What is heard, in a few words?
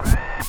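machine gun power up; Allegiance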